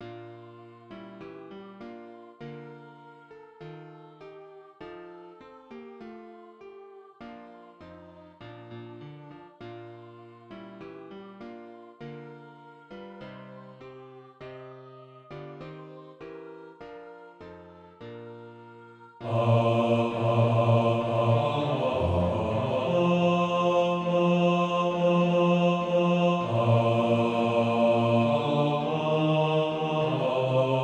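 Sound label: bass, Practise Music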